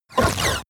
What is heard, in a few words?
sci-fi laser shot short